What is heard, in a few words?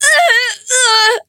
VO Druid Death; battlerite